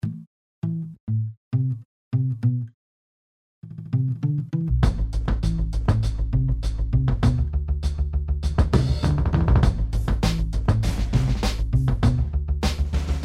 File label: Feel Break Feel Beat